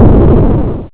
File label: explosion; RocketPatrolMods